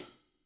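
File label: hats closed; Sonic Reality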